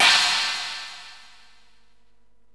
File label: CH CYMBAL